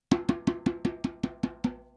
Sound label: Djembe